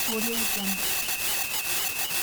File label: scifi rhythmic radio interference loop